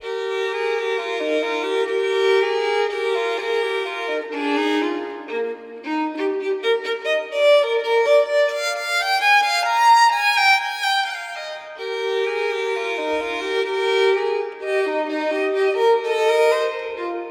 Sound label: Partita no. 3 in E major Menuet; Bach; BWV 1006; V. Menuet II